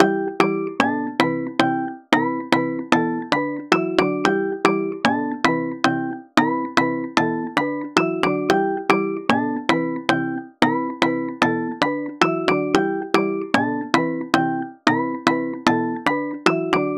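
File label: organic loop kalimba, 113, G major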